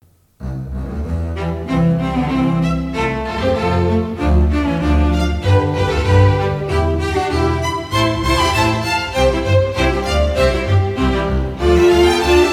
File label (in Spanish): burro flautista